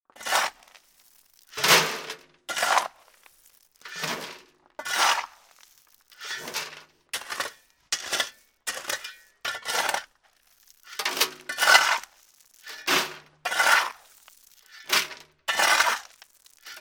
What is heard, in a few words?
Shovel Gravel Plastic Shovel Wheelbarrow